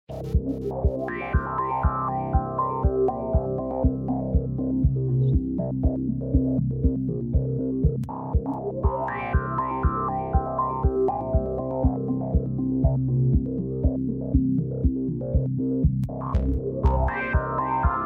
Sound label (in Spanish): music